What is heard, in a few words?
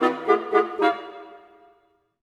Orchestral Loops; 128 VERTIGO